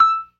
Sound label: PERC, ORGAN; Keith Emerson Signature Series